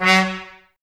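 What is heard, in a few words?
Brass trumpets F#, Mute; Miroslav Vitous - Woodwind Brass Ensemble; Tps Mute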